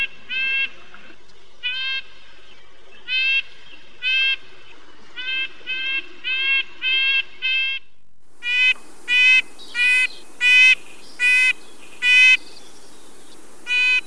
redbreasted nuthatch

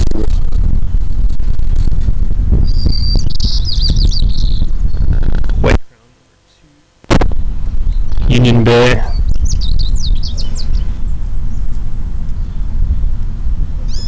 song, calls; wcsp #2 song 1 and 2

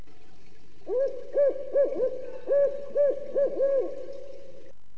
Barred Owl

